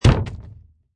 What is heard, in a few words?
Wood Impact, Hit wood, with slight rattling sound.